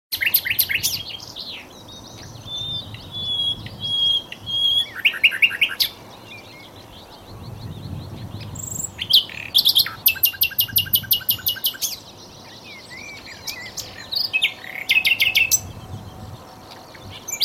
suara burung nightingale

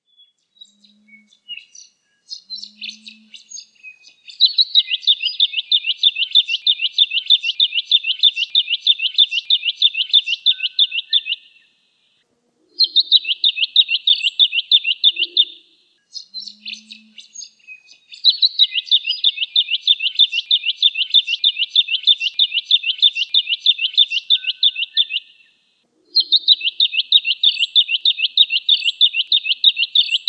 suara jacky winter

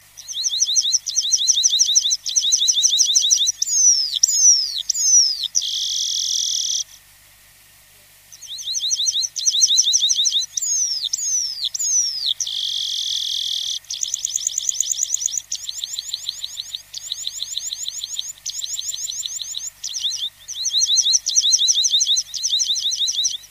suara burung apung kijang

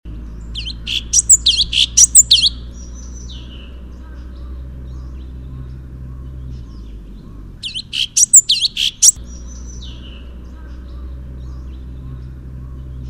suara kicauan cucak cungkok

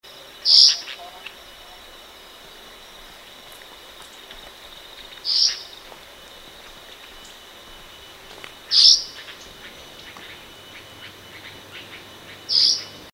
ciung call

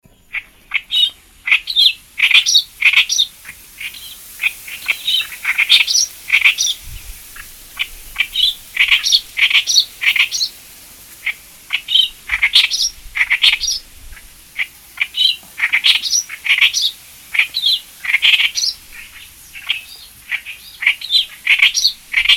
kerakbasi ramai

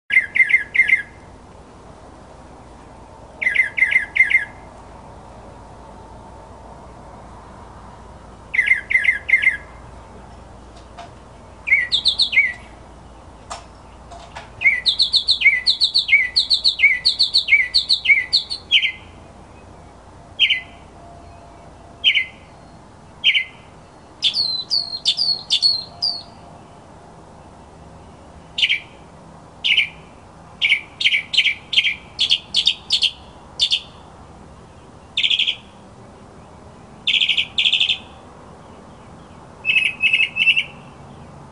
kicauan burung northern mockingbird

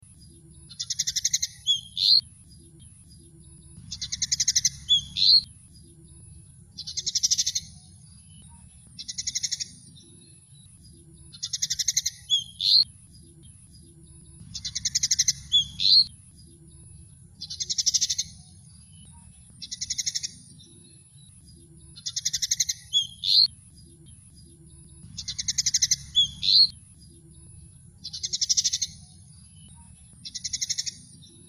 suara decu belang betina

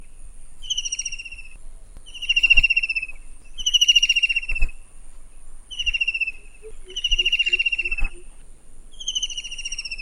cekakak belukar

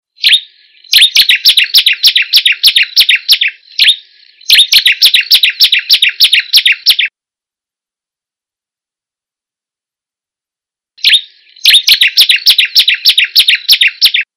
ciblek kebun

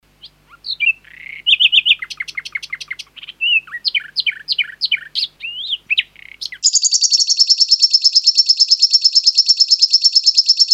kombinasi lovebird nightingale

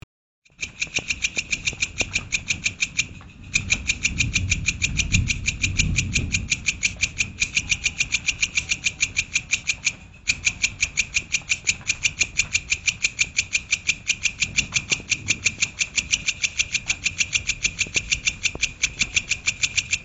belalang kerek

